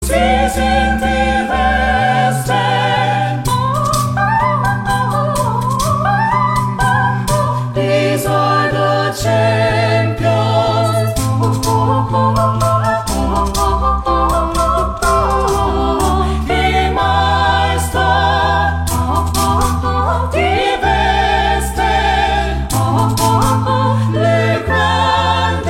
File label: Acapella; UEFA Champions League